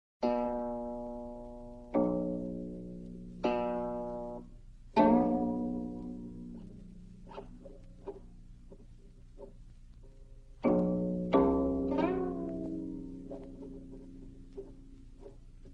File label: Flowing Streams, China; Voyagers Golden Record; kuan Ping hu